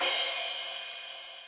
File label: crash, Zoom MRT-3B